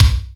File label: BD, Yamaha RY-10, Drums